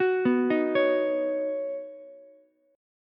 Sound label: B Major Add 9th, Ukulele; arpeggiated; 11,11,11,16; JGuitar